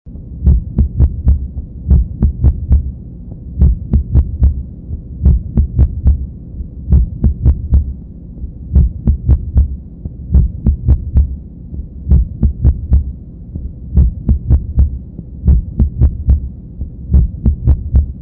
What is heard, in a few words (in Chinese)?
二联律